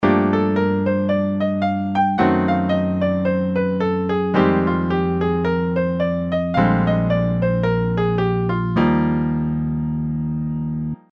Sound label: diminished scale impro, piano